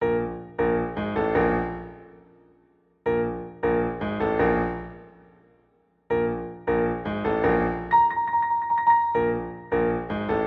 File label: Piano Cover